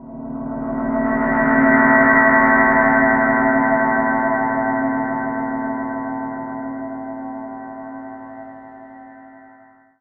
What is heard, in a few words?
FX Feedback Swell